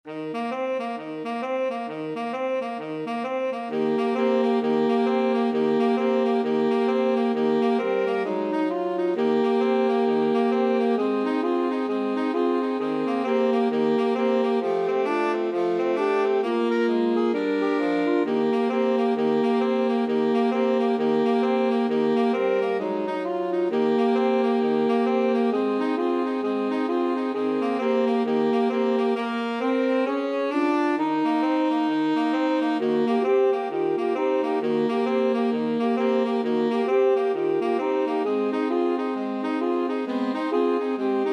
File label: 2 altos tenor sax